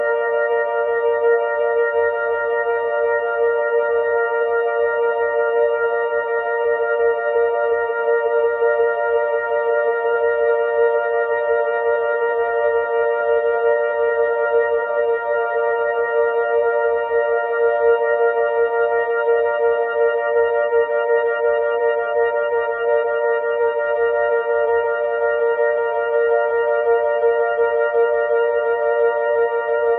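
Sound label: evolution of synthetic drones in ambient music; Синтетические инструменты и текстуры (future whooshes, drones)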